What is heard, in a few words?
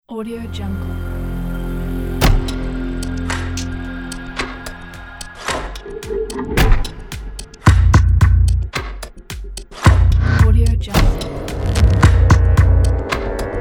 scifi abstract experimental